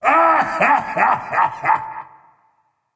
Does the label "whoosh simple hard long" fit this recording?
No